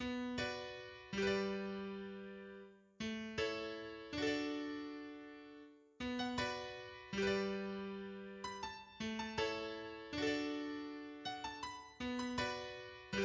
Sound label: Harp sound